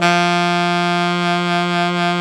SAX ALTO MF; Roland LCDP07 Super Sax; Alto 414

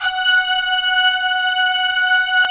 fast strings, Fast & Slow; Optical Media International; Sonic Images Library

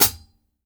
CLOSED FOOT, Drumworks; Sampleheads - New York City Drumworks VOL-1; SW KITS

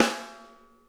RIM, snare; Sonic Reality; Interactive Drum Kits

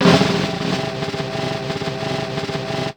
Snare Roll, Ensoniq ASR-X Pro

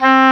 OBOE, Orchestral Winds, Dry; Roland LCDP04; Oboe 4-8

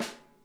snare, drum kits

